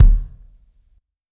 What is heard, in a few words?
Kick, drum